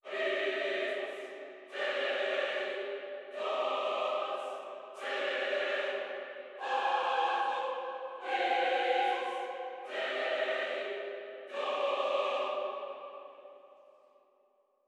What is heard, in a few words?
147 BPM C Min Choir; Melodies; Loop Stems; Cymatics; Saga; PHARAOH Beta Pack; Pharaoh Premium Drum Samples (BETA)